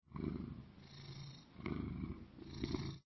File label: purr, cat; ayuncraft-1.5